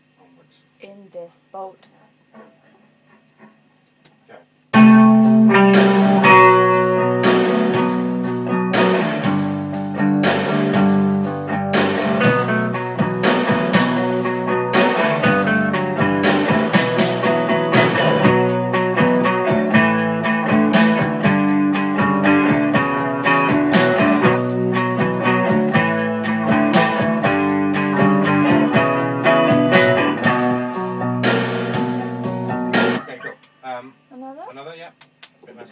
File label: guitar hooks